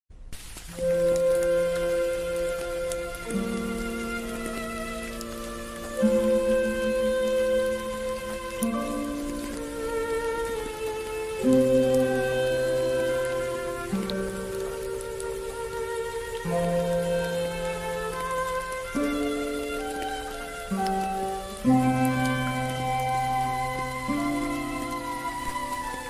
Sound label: Rain and violin